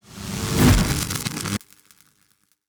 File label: electricity static power up; mixkit; electric fx